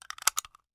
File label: Machine Gun; Machine Gun Clip